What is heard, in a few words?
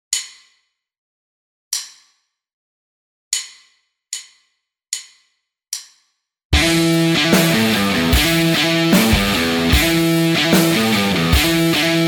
Riff, guitar; Audiowave; Pickipedia: learn to play 23 rhythm guitar riffs